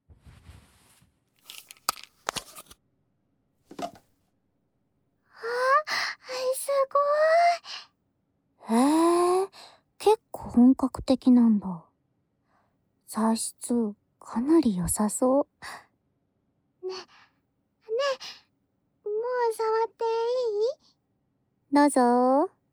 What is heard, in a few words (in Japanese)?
スライム遊び, ASMR